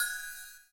CYM, Ethno Techno; ILIO - Ethno Techno

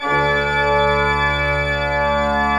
ORG D2 MF

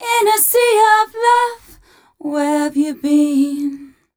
f 132 mel, Vocals, fem

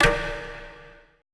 TUNEDRM A#1 R; BPC DRUMS

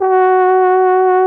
BARITON; Best Service; Alpine Volksmusik

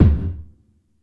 Gated Kick, Gated Reverb; Univerb; musicradar

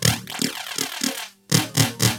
LOOP, TECHNO; TECHNO-BOX; ELEC-LOOPS

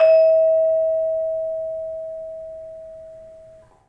vibes; 3D Sound; 3D Keys VOL-1

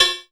hat, drum; Masterbits - Total Zone

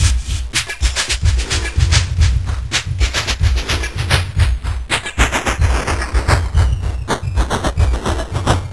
Industrial Loops, UZINE FX

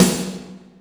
AMBIENT SNA, M1-M3R KITS; Greytsounds VOL-1

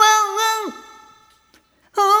Rhythmic A

